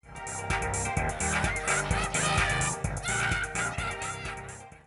Play Stinger

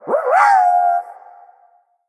Male Beast

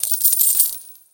Coin Underlay Death

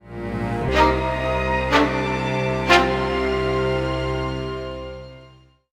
Play Stinger

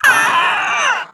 Direhorn Hatchling Death

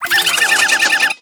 Coppertail Imposter Death

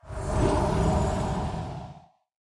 Travelling Healer Attack

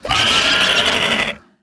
Wasteland Scorpid Death

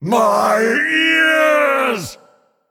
GONG

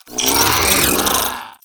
Emerald Reaver Death

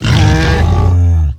Death

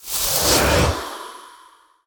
Magic Broomstick Underlay Attack

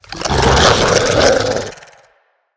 Spirit Wolf Attack

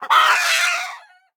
Albatross Death

